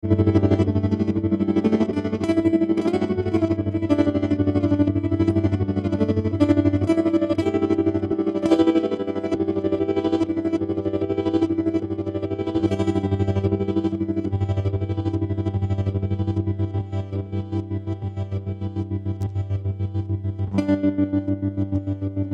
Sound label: trem